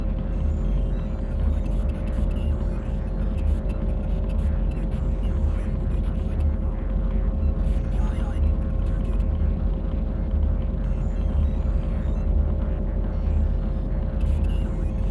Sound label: ambient